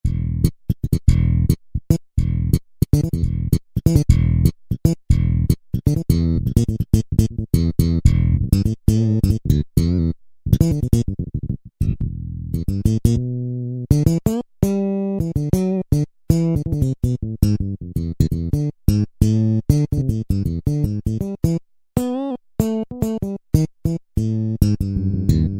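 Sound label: Bass Boiled; Yamaha EX5R; Factory presets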